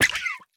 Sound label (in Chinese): 美西螈死亡声